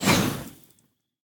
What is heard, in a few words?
roman ranged bow projectile; smite; npc; roman archer